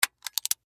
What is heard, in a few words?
Steampunk Device, Scifi, Steampunk, Device, Clicks, Small; BlastWave